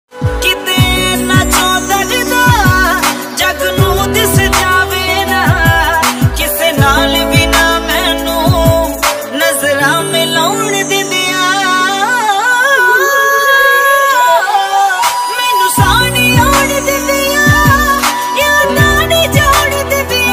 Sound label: female punjabi ringtone